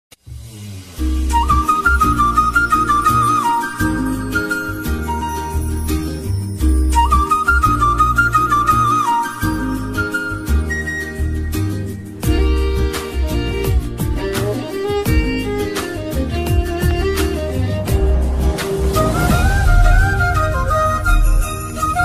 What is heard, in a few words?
flute ringtone bgm ringtone flute music; mukunda